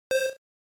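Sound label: Game Boy General Pitch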